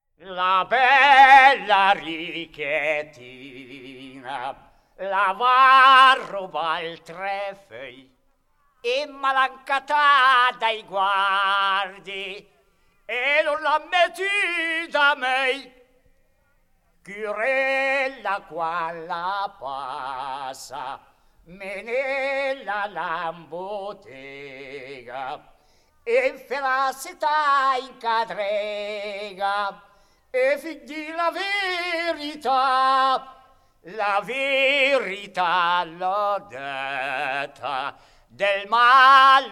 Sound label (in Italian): la bella Richiettina; BRIANZA PRIMA PARTE - CANTE, CONTE, CORI, FILASTROCCHE E BANDE DI FIRLINFEU